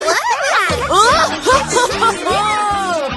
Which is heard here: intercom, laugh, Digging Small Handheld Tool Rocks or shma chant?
laugh